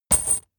metal chain impact

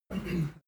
male harrumph; menschen; koerpergeraeusche; soundpool

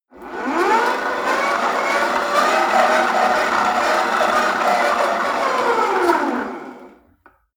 rope trainer pull sequence fast long; sport freizeit; fitnessstudio

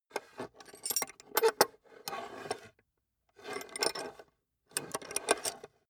small metal handling on wood; industrie wirtschaft; metall

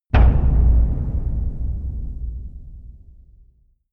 dark cavern hit; hits explosionen

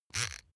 razor clatter single

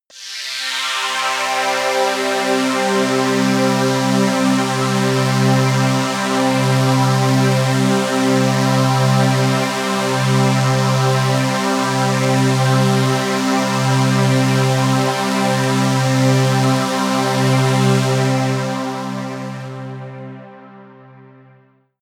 pad sweep highpass; flaechen

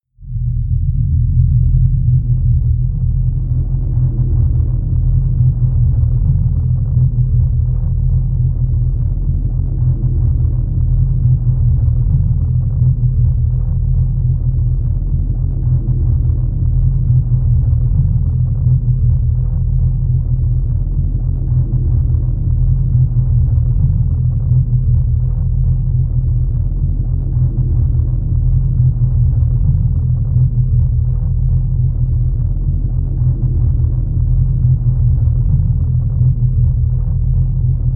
drone tracer

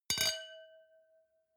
wine glass similar clink bounce; berufe; gastronomie; soundpool

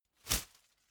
whoosh paper scrap flutter

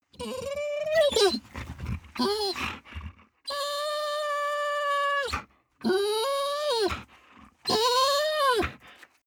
dog whine long; natur-tiere; hunde

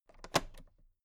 toolbox latch open soft

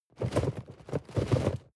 cardboard box medium shake short; objekte

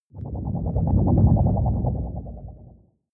whoosh storm wobble hard; swooshes-swishes

